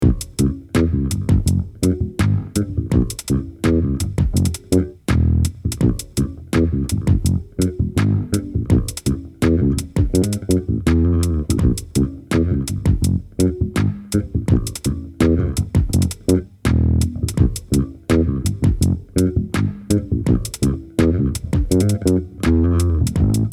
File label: Bass DI Amp